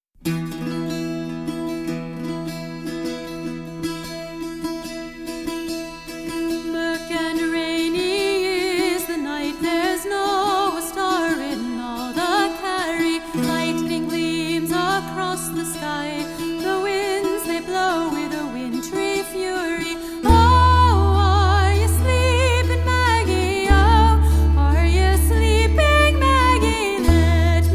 Celtic Band; Houston; Are Ye Sleepin Maggie

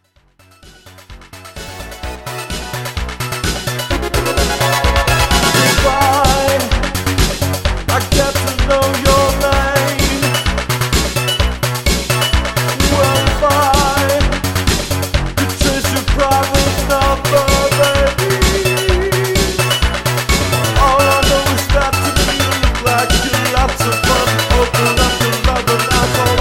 Cover Band; Omaha

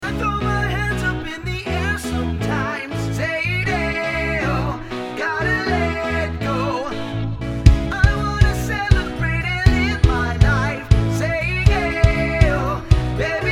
Cover Band

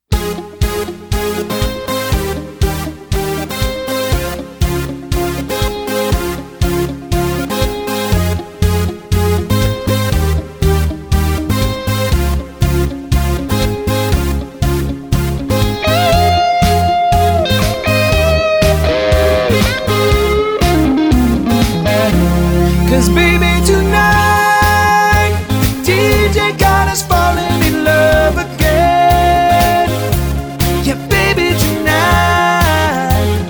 Wedding Band; Nashville

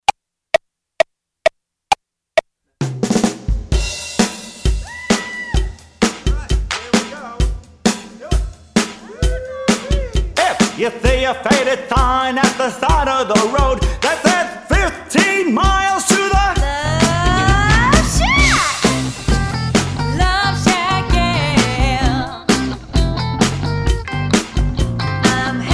80s band, cowbell

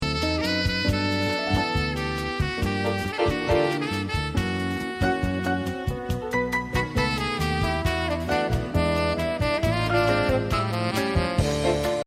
Jazz Saxophonist; Albuquerque

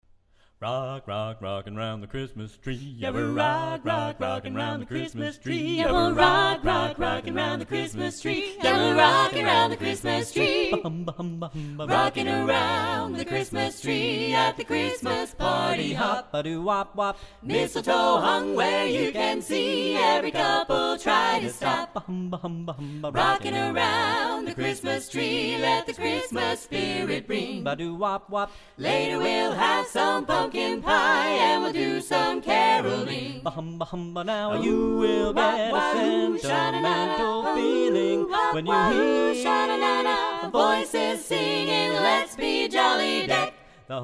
Christmas Carolers

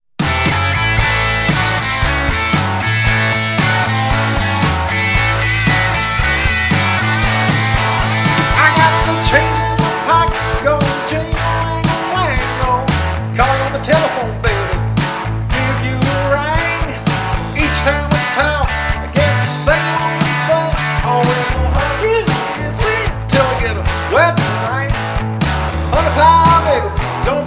Cover Band; Omaha

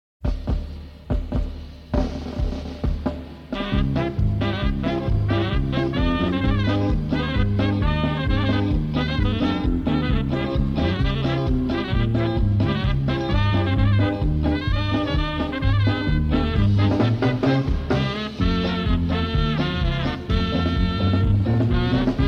German Band, Polka; Kansas

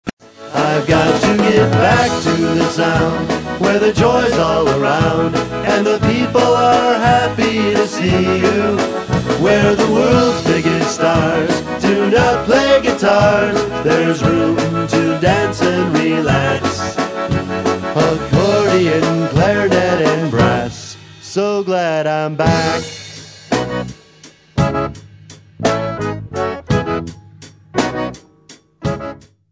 Polka Band; Detroit

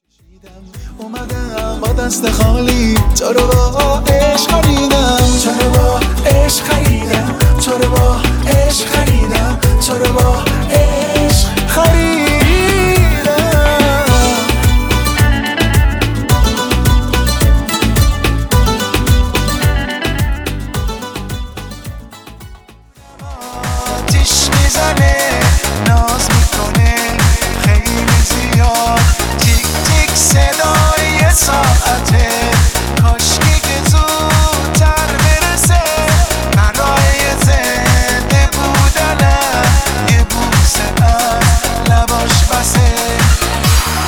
Persian Singer

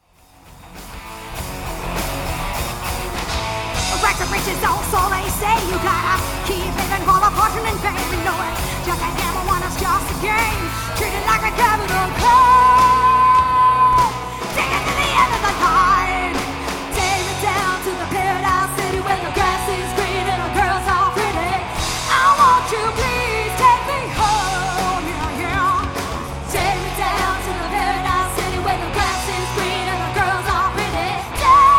All Female Variety Band, live; Las Vegas

